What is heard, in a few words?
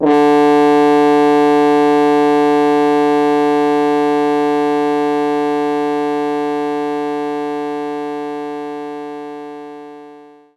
French Horn D2